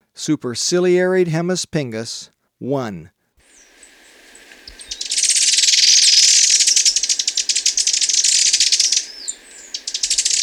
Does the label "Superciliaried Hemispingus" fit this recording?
Yes